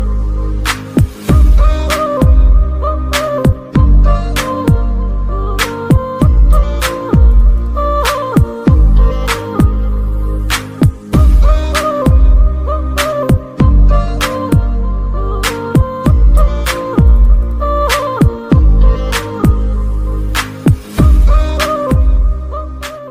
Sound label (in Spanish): nuevo tono de llamada en ingles